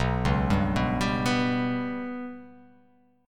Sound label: B Suspended 2nd Sharp 5th